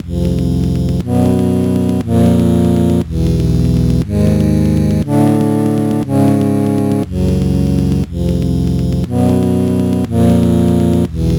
World 8 Map; SMA4

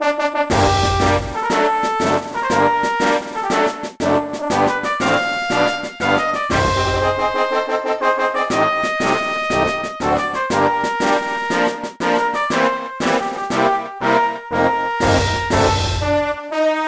Ghost Ship

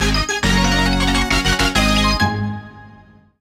Level Up

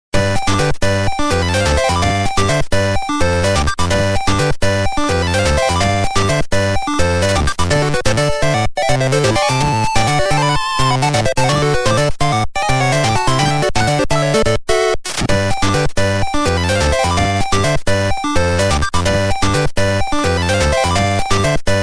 Music Room Theme

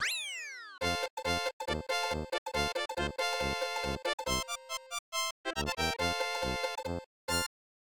Course Clear Fanfare; Super Mario World; Super Mario Maker

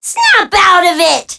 Alarm Clock

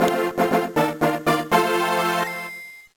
Jingle; Sparring Wario

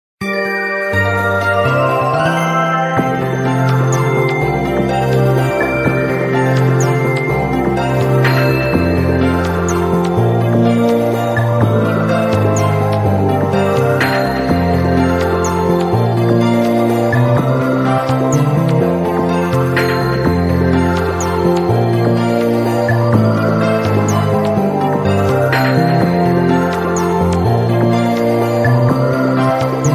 Underwater; SMM; SMW

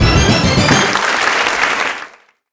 1000-Up; SM3DL

